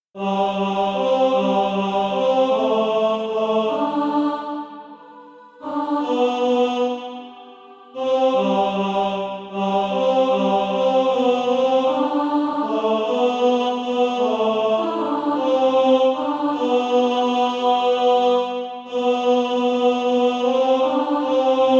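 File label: tenor, Practise Music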